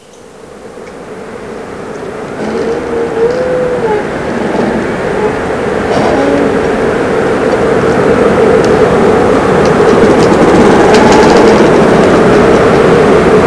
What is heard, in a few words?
wind